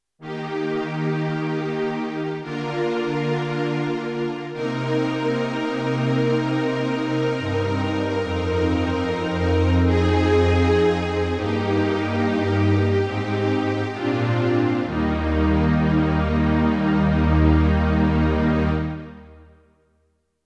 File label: Yamaha NP32 Strings